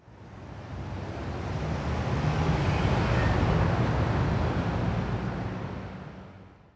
in autko; Mafie 1

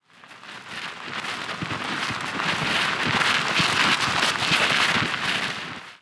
auto sterk; Mafie 1

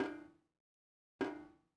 kapani; Mafie 1

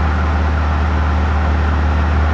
auto; Mafie 1

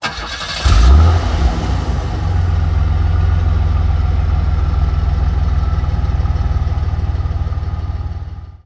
auto start; Mafie 1

